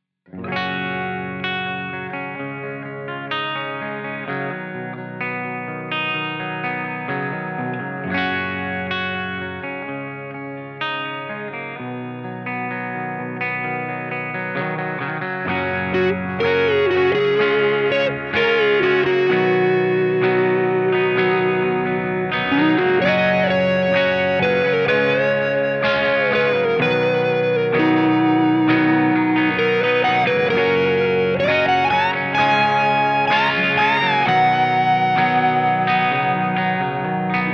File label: Plexi Vamp